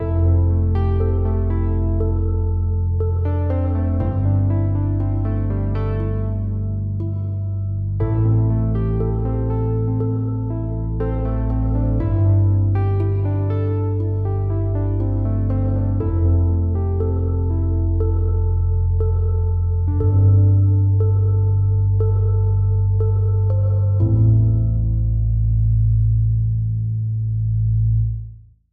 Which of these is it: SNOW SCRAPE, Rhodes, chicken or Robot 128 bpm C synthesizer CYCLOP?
Rhodes